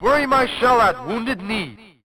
Level Intro; Bury My Shell at Wounded Knee

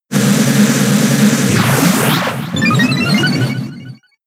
Defend Order; Pokemon; gen 6; XY; ORAS

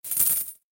money up

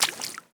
walk water

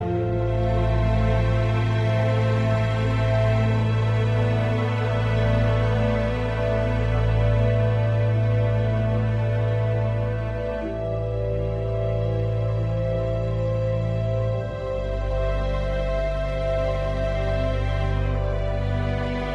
Loop Neutral

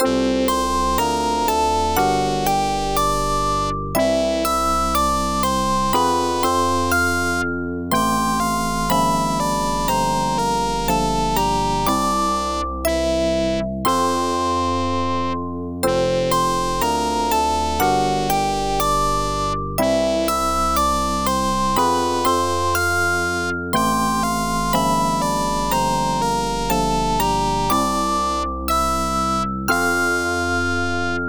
Title Music; Disney's Pinocchio; Genesis

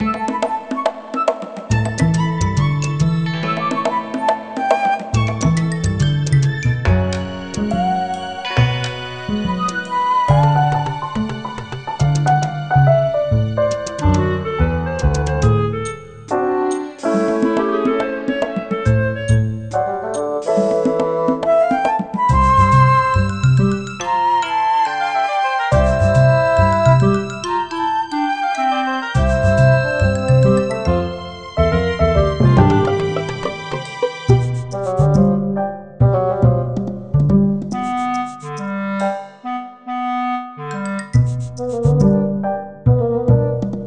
Game Music

